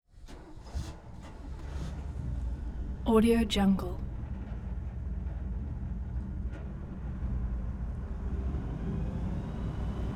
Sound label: 1977 chris craft twin engine fishing boat; prosoundfx